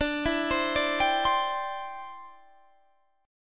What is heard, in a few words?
D 7th Suspended 2nd Suspended 4th D, Guitar Standard; D7sus2sus4; 22,19,22,19,20,20; arpeggiated; capo=2